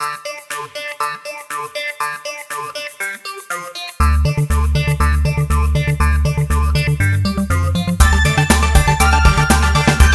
music disco; Sony Ericsson; A8i; cmcc

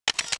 shutter cont; LG SU780 Lollipop 3

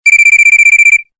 Cricket Beep; LG CG180

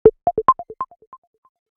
Crisp, notifications; Realme 8

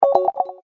Low Battery

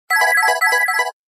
Serene tone; Samsung GT-M3200 Beat S

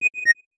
Video Stop, ui; HMD Skyline India

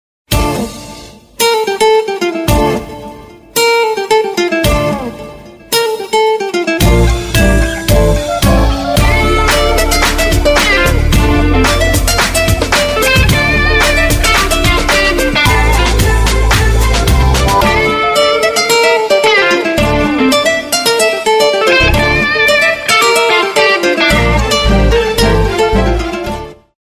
ringtone; Gionee; GN305

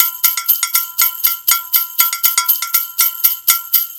music alarm rhythm; Samsung GT-i7680; cmcc

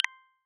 Camcorder Stop; Windows Phone apps; Camera

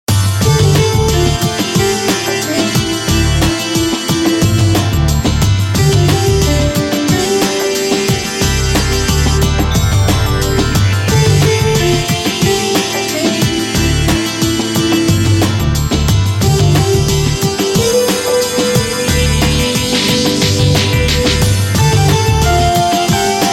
Belly Dance; Samsung X01SC Omnia PRO

